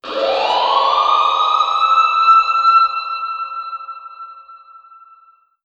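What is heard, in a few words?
alarm siren, reverb processed, 170bpm; musicradar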